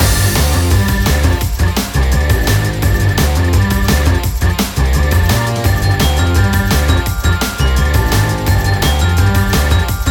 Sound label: Rapid loop; PeriTune Material